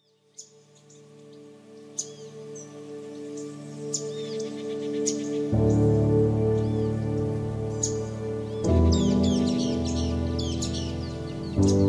Bird and piano

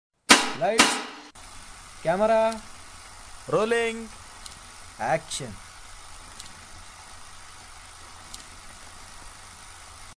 Camera action sound; صدا دوربین حرکت

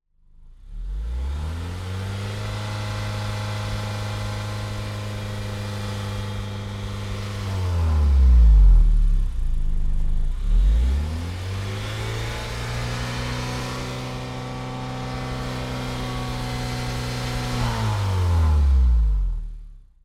Car Driving

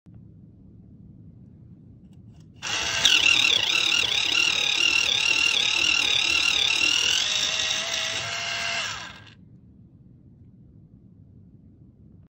Pencil Sharpener; تراش رومیزی

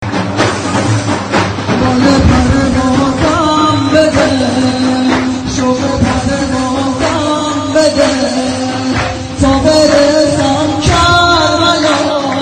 daf; دف نوازی برای مولودی

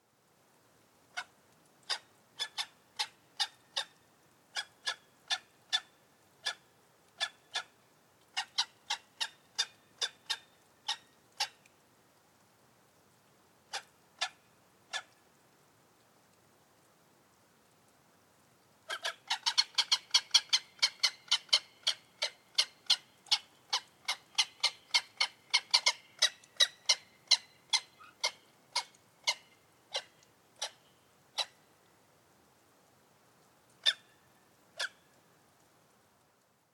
Gyrfalcon

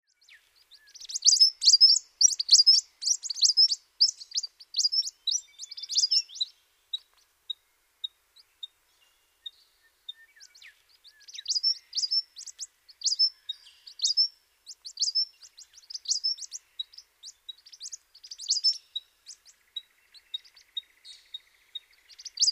Eastern spinebill

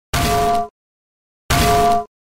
frying pan; ضربه زدن با ماهیتابه

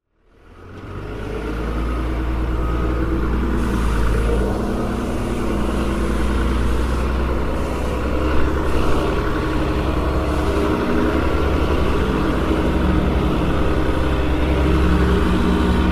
Tow truck; ماشین یدک کش

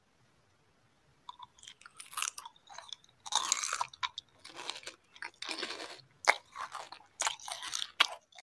Eating Smarties; اسمر خوردن اسمارتیز

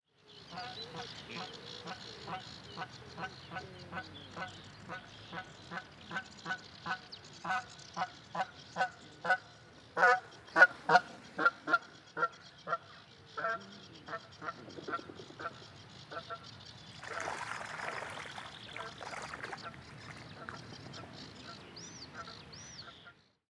Whooper swan

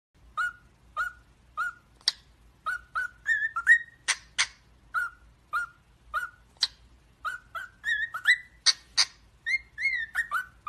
Male female Dutch bride singing; عروس هلندی

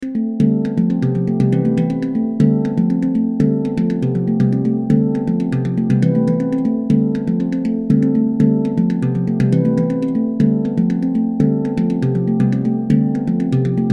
handpan dream; olistik

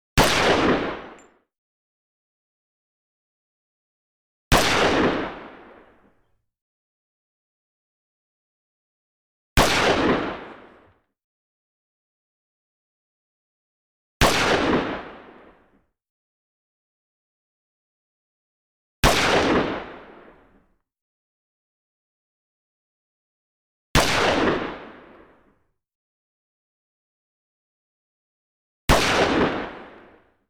Hand Gun Colt Government 1911a1

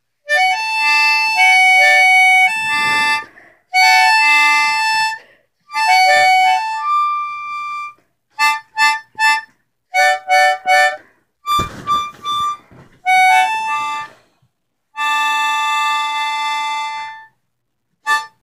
Harmonicaa

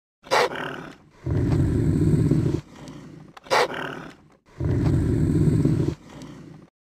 wolverine; ولورین

